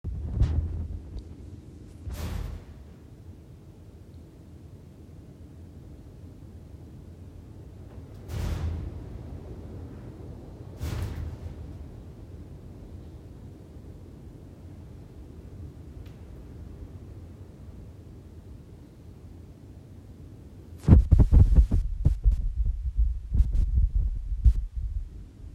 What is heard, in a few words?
wind Slamming Sound, Mysterious Old House Sounds; he says similar to a metal door slamming; he's been hearing his house make (but only when the wind is going fast enough); Dave Winer; Scripting News